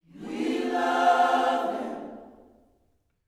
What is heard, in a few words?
WE LUV HIM, Vocal Planet Gospel; Spectrasonics; GOSP PHR